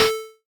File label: bumper